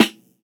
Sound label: Snr, Roland MV8800, LAYERED